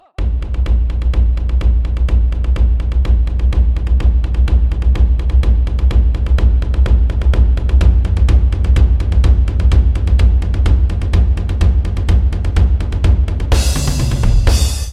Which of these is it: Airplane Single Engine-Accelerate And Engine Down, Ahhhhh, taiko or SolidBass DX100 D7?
taiko